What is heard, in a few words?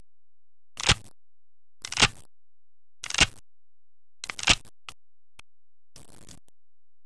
GUN